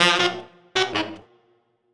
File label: Sax